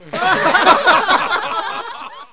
laugh

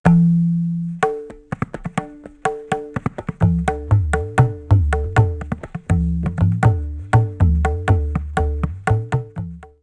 TABLAS

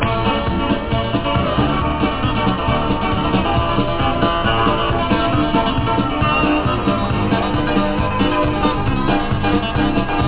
DANCE; hotjava demo

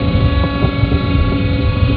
STONE LOOP

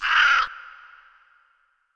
Rock bird; ImpGal2; colony

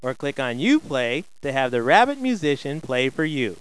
MUSIC

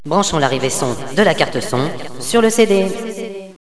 kit; Villagina; ecole

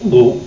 gulp; Amiga; odyssey

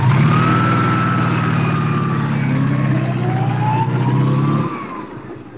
movement

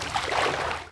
stroke; ambient

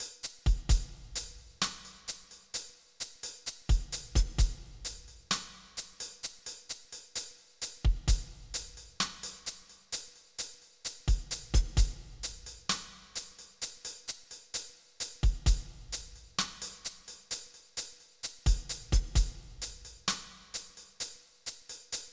drums; SuperModels - Exotic and Wild Fantasies

